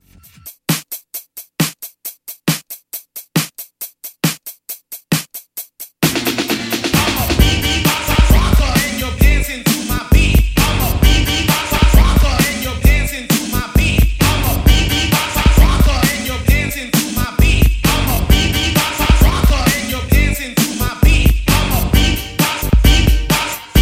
beatbox

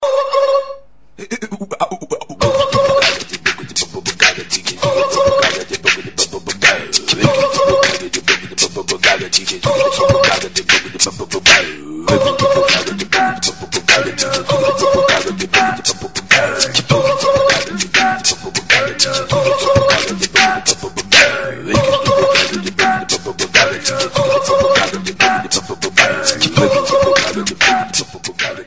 beatbox ringtone

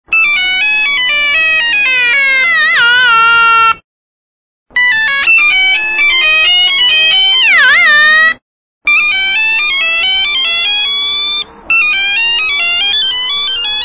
nokia crazy mix

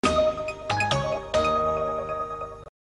Samsung SMS

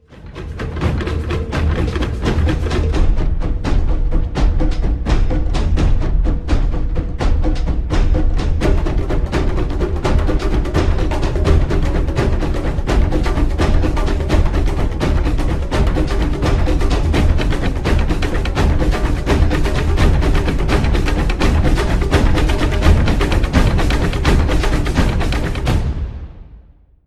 jungle drums, jumanji trommeln sound

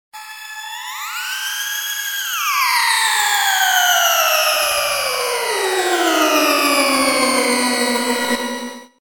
scifi sound

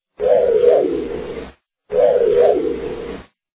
kitt scanner sound; knight rider 2009

